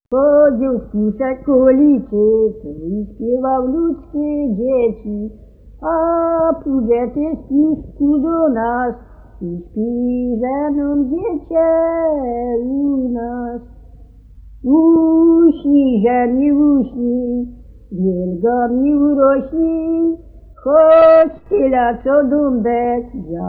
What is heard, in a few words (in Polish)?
kołysanka; Chodził spiuszek w okolicy; Maćkówka; nagr. F. Kotula 1965